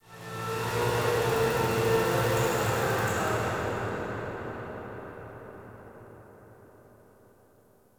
Extraction2 Drill Blast; Warframe; Ambience; Extraction2 Drill Blasts

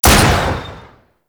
Scope Gun Shot; Warframe; Weapons; Corpus

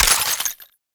Ammo Pickup Default; Warframe; Frumentarius; Ammo Ability

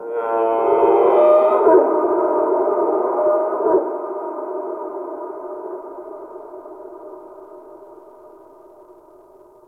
Ice Creature Distant Cry

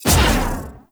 Pulse Laser Shot; Warframe; Railjack; RailJack Weapons

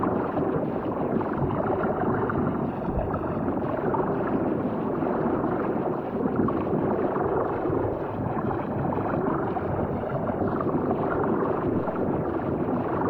reactor loop; Mod Quest; Warframe; The Teacher

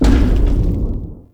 Step; Warframe; The New War; Sentient Throne Room Gameplay; Cinematics; Erra

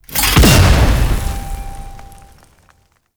Heavy Stomp Impact; Abilities; Warframe; Tau; Dax Heavy

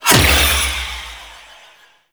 Shackle Impact; Warframe; The New War; Deacons

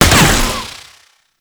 Shield Lancer Pistol Alt Fire; Warframe; Lasrian Soldiers; Weapons

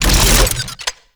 Beam End, Archwing Primary; Warframe; Larkspur Prime

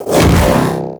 Hoverboard Jump Spin A; Vehicles; Warframe; Venus Hoverboard

